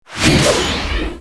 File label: BLOW